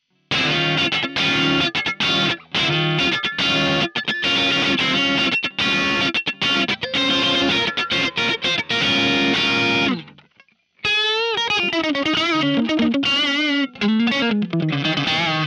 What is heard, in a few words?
Crunch